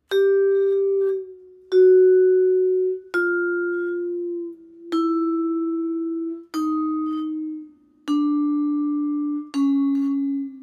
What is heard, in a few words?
vibraphone bend